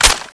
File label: clip out; tfa cso; x-7